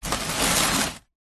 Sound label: foley cardboard box shift movement on gritty hard surface; zapsplat